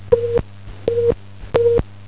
tututut

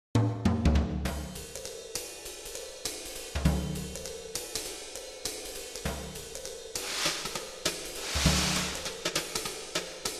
cooking; Geschicklichkeit; Mean Cuisine; music

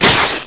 rip; Take2 v1.7

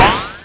bonus letter drop; F1 Licenseware - Volume 1

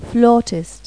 FLAUTIST; Pipo-Aprende musica con Pipo

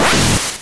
put prop

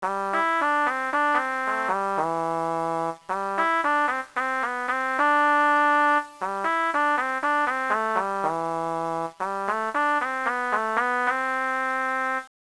C TRUMP

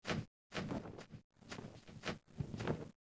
walk; poolofradiance

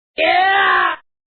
yargh

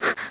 pant, dogz

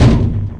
menu popup; quake 2

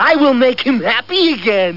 vocal

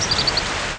rainbird

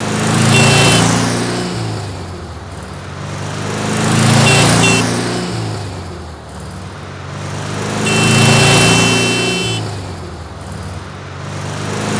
Car

